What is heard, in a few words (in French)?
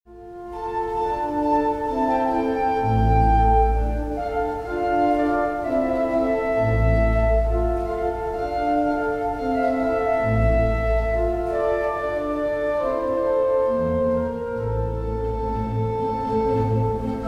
Orgue Medley